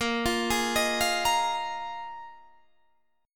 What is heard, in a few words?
A sharp 7th Suspended 4th, guitar